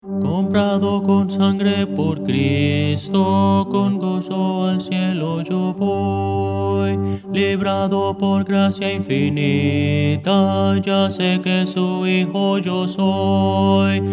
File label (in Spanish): tenor, Himnos Cristianos; comprado con sangre por cristo